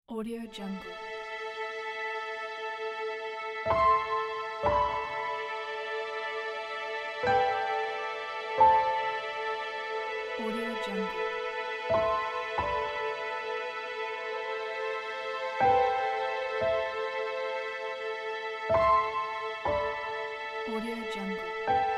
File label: minimalistic piano and strings